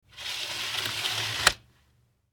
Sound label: Curtains